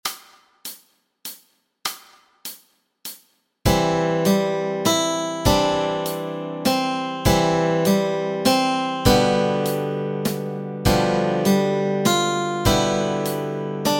Rock a bye Baby melody and chords

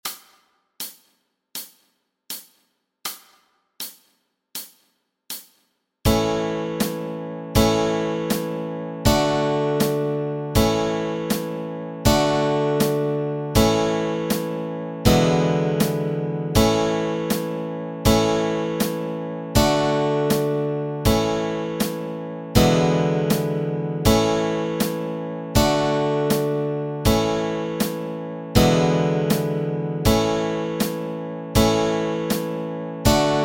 Chords Track, Easy Guitar and Ukulele

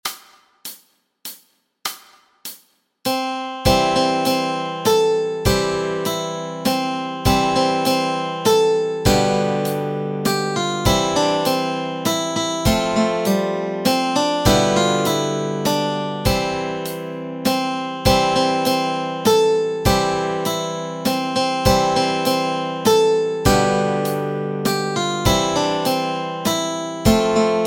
Melody and Chords, Easy Guitar and

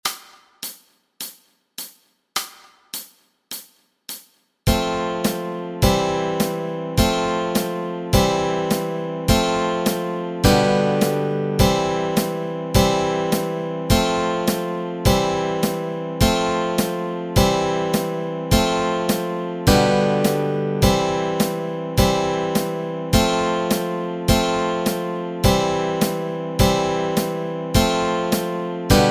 chords, Easy Guitar and Ukulele

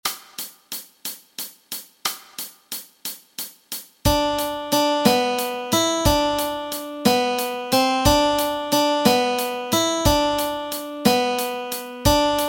melody

